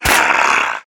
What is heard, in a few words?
Voices zombie death